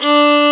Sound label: Violin D4; soundbank; instruments